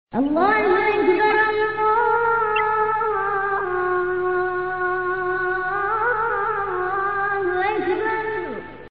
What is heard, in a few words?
allahu akbar ringtone